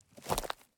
Footstep Step Dry Grass Shrubs Pine Needles Meadow; PMSFX